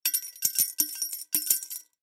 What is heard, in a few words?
Coins Glass Jar Drop In Multiple; Money; Foley